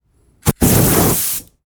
fire whoosh

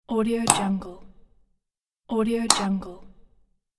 switch on off

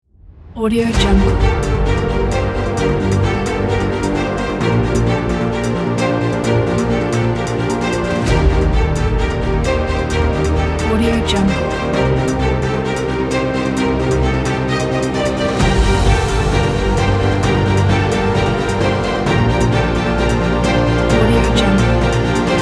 cinematic hero

